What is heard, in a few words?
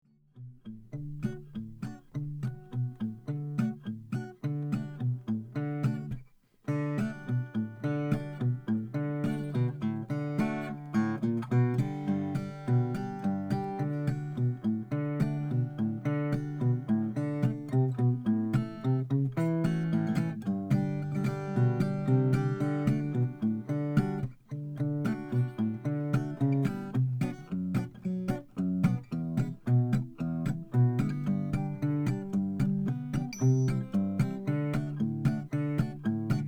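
Square Deal guitar C 0 12 Madagascar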